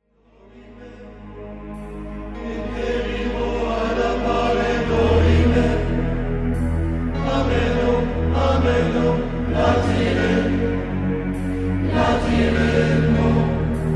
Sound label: SATB, harmonisations